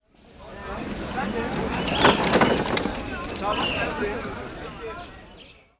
city, Ambient; Zeus

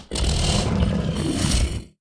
growl; gozilla

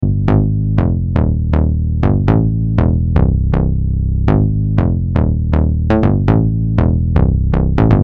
Bass Line

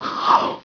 YAWN; dogz

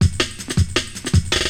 drum loop D+B 160; eJay

